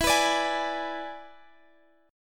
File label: E 7th E down Guitar Open G